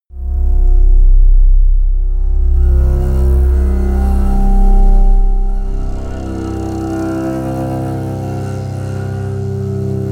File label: broken cello; flaechen